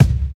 raw kick drum single shot c sharp key

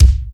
clean bass drum c key

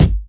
clean kick sound g key

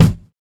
sharp kick drum single shot e key; kick drum samples

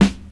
clean snare sound g key

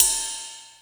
ride sound clip c key

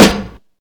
boomy snare one shot g sharp key; snare drum samples; one shots

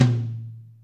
big room tom drum a sharp key

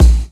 short hip hop kick drum a sharp key

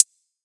hi hat b key; drum single hits one shots

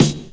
00s Smooth Snare D Key; snare drum samples

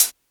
raw hi hat one shot g sharp key

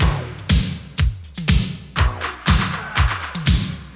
121 bpm 80s breakbeat sample b key

